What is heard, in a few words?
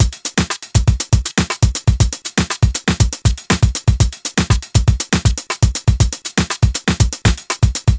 120 beat full, Nu Jazz House; PowerFX